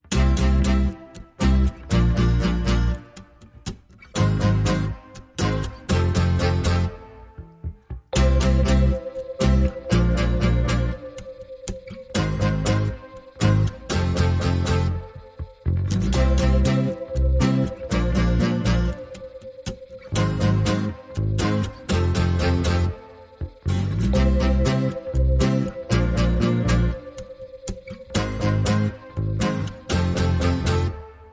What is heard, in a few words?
No Perc